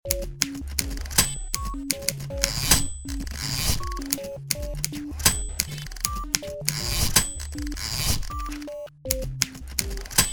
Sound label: Typewriter Transition